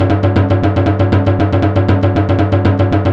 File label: timbale, Yamaha TG-500